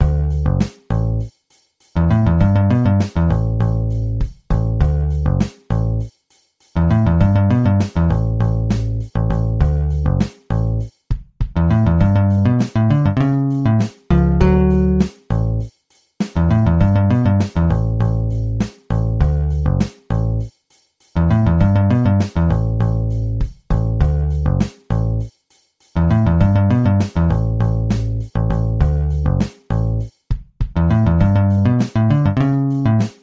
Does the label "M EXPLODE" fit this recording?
No